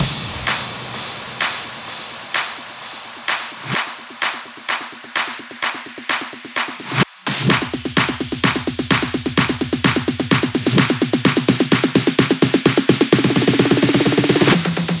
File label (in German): Drum Loop 128BPM FULL ohne BD